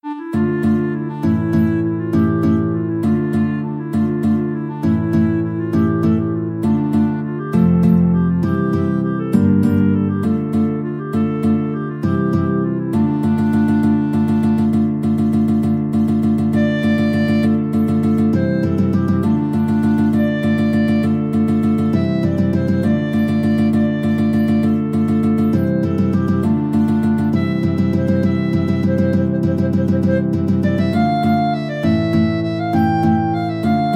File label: pirates clarinet guitar, guitar clarinet duet